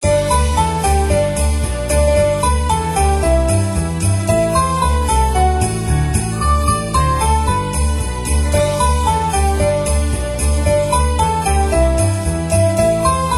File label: jingle bells